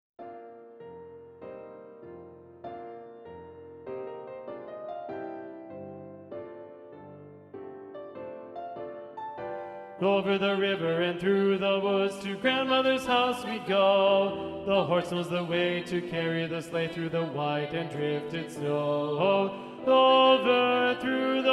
Tenor 2

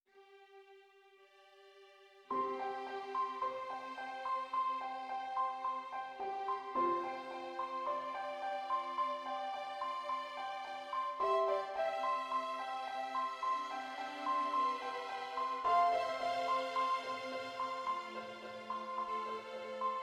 Soprano 1

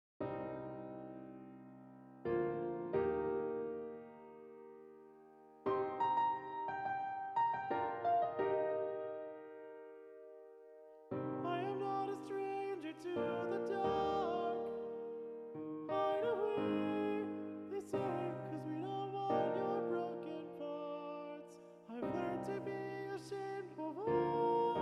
Tenor2

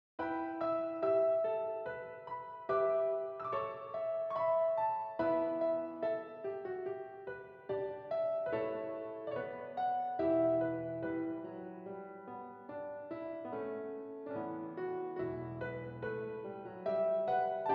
Wexford Carol Tenor

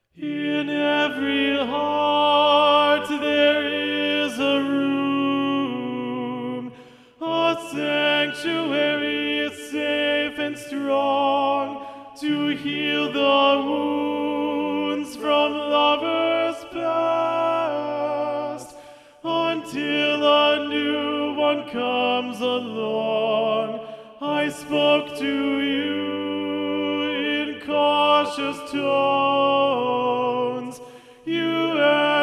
Alto2

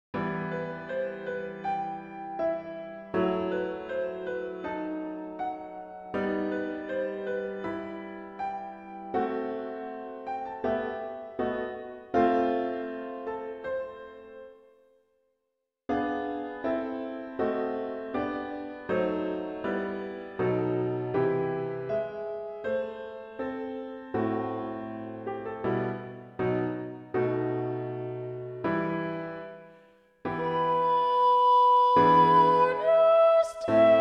Mass No2 in G Agnus Dei Balanced

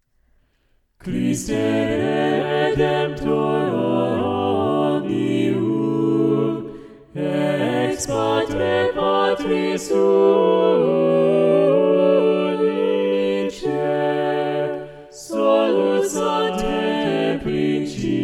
Balanced, chorale; Christe Redemptor Omnium

